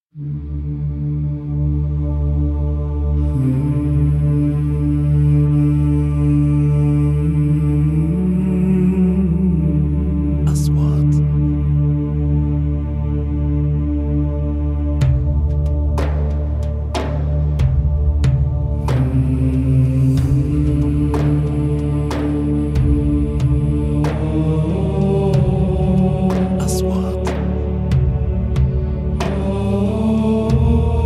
vocals

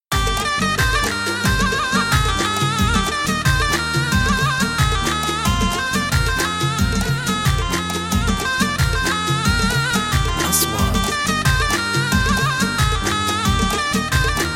khaliji

